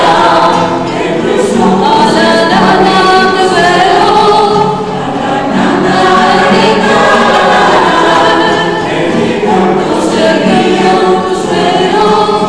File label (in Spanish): CORAL; ACTIVIDADES RELIGIOSAS